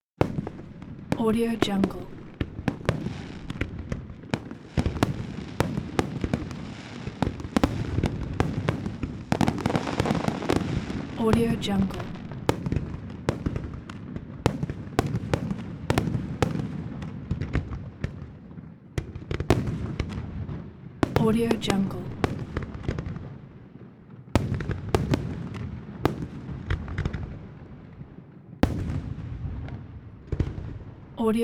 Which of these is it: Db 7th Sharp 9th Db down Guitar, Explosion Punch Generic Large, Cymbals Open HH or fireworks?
fireworks